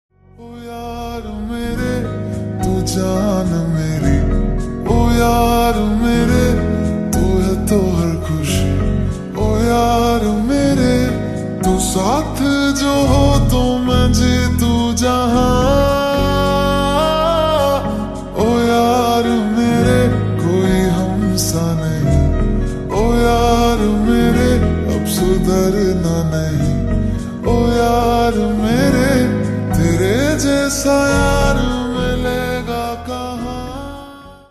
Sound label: Slowed Reverb Ringtone